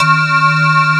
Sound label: METAL BAZZ